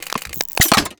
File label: steampunk mechanical mechanism metal; Bluezone Corporation